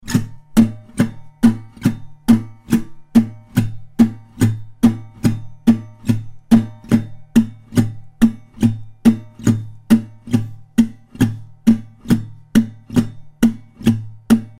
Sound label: la pompe muted, guitar